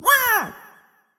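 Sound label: jump; super mombo quest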